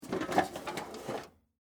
Tool Box Tray, Industry, Tool, Box, Inner, Tray, Slide, Up, Metal, Case, Antique; Hand Tools